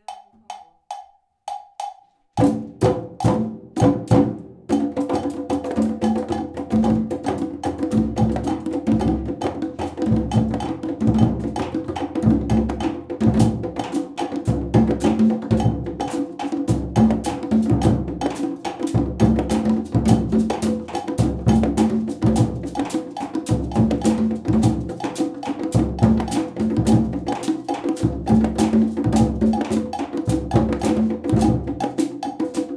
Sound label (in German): congasolo